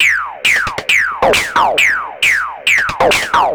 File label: Lazer Face 135BPM, sci-fi Beats